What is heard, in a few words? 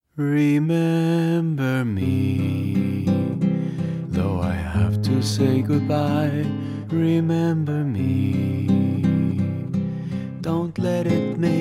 Lullaby; Coco (2017); Disney